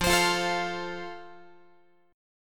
F Major, Guitar; strummed

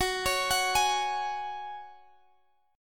Gb Suspended 2nd, Guitar, Standard; arpeggiated; capo 2; x,x,16,18,19,16

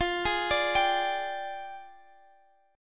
F Minor 6th Add 9th F

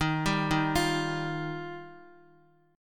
Eb Major Add 9th Eb down Guitar; Ebadd9 arpeggiated; JGuitar

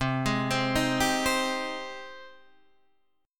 Ab Major 7th, C

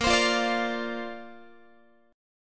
Bb Major Add 9th, Bb, down, Guitar; Bbadd9 strummed

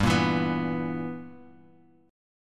B Major 7th Suspended 4th Sharp 5th G

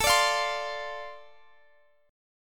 D-Minor 7th A, chord

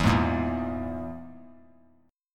E Major Flat 5th; strummed; JGuitar

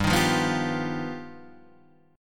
G Augmented 7th, Guitar Half Step Down

